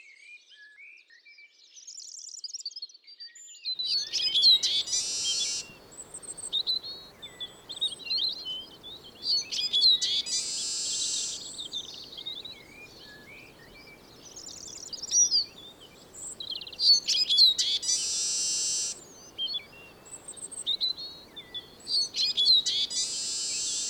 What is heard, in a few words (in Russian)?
chizh